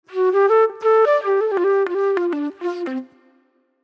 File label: Classic Soul Flute